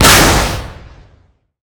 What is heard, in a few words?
Weapons Missile Launch; DCS